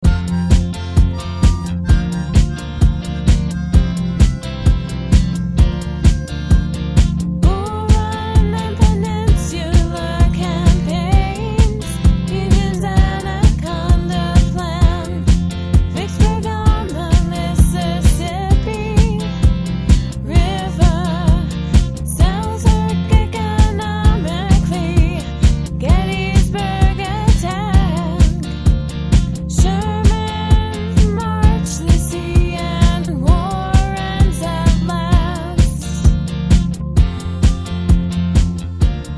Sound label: triplets; cwbattles